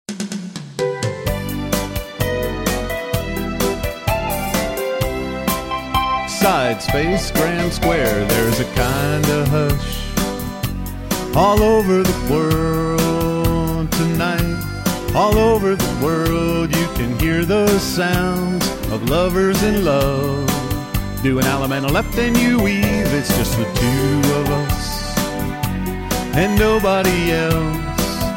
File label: vocal